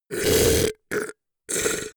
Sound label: beast choke deadly